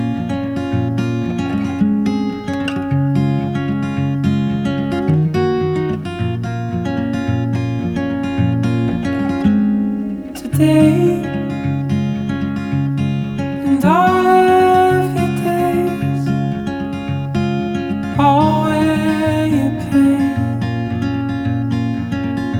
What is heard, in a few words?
Acoustic; Alternative; Альтернатива